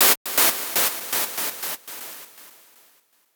Gated Noise 120BPM; musicradar

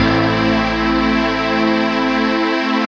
SYN THEEE, Dance Synth; Sound & Vision - Gigapack I CD 2 (Roland)

